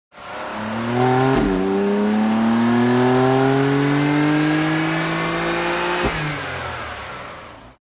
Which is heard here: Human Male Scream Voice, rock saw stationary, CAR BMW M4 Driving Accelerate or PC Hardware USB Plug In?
CAR BMW M4 Driving Accelerate